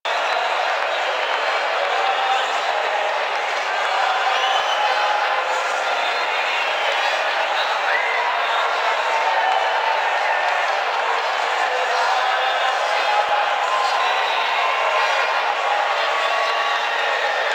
Television Audio; Ambience; Props; Warframe; NineteenNinetyNine

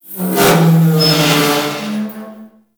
Ocean Pipe Moan; Warframe; Grineer Ocean

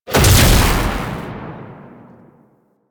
Proj Explosion, Weapons; Warframe; Fulmin Prime

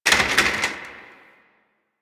Office Chair Impact; Warframe; Ambience; Nineteen Ninety Nine

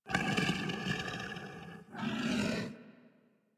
Feral Vocal Breath Walk; Enemies; Kubrow; Warframe

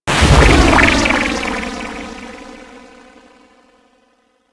Frame Spawn In; Warframe

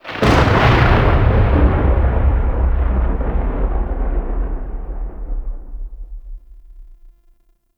Invasion Thunder; Warframe; Cetus

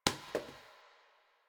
Janitor Paper Towel Drop; Warframe; Ambience; Nineteen Ninety Nine

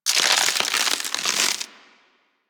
Platform Crack; Warframe; Cephalon Weave; Weave Boss

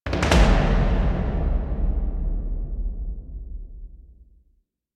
Transition, Music; Warframe; DE; Dynamic Music; Grineer; Grineer One

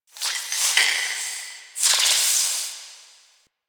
Interior Hiss; Ambience; Misc Indoor; DE; Warframe; Infested Eris

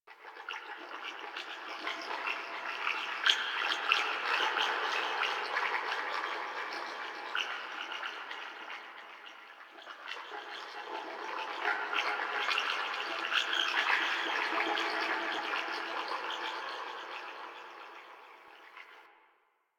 Lair Chatter Loop Interior; Warframe; Ambience; Sentient

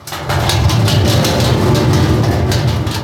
Shipyards Barrel, Ambience, Props; Warframe; Grineer Shipyards

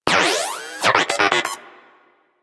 Moa Inquisitive Vocal Greeting; Warframe

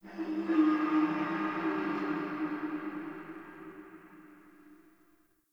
eerie metal; Ambience; Dungeons; Alley; Under City

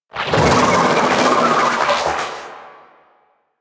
Vocal Attack; Warframe; Sentients; Conculyst

